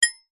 household crockery ceramic hit with fork muted ping; zapsplat